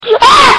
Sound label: blue kid scream; soundboard